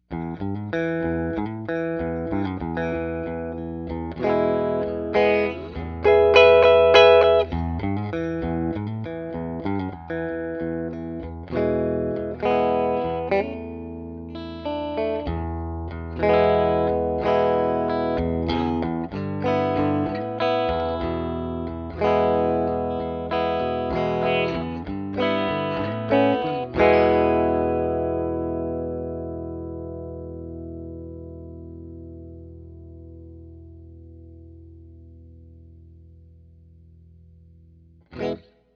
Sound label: Middle Clean